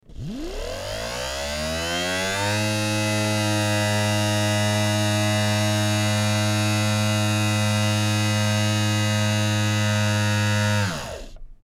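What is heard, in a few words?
Mechanism Long Steady, servos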